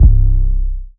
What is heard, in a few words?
808 - C; Haymaker; Cymatics; 2022 Melody Mystery Expansion